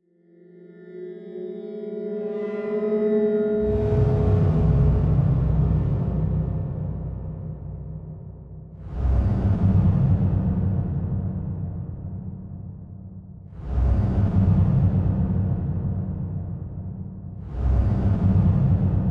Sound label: Electronic Whoosh Reverse Feedback Digital; Production Element Title Imaging